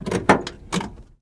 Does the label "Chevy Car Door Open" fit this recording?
Yes